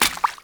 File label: Steps Water, Footsteps; Enemies; Foley; Warframe; Grineer Royal Jester